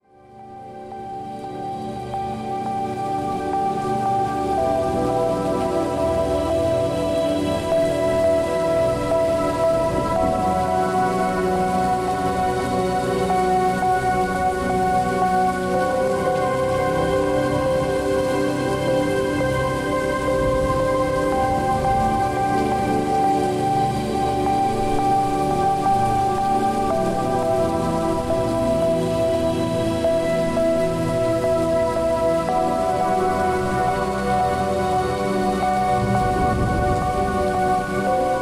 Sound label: Rain Sounds Ambience Of Rain